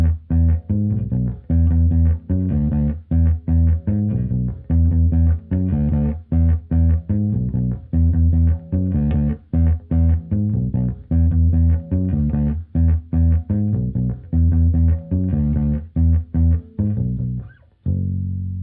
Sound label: BASS HARDWARE